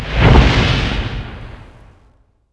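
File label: cigarette on the ground; cigaro na zem; Mafie 1 cz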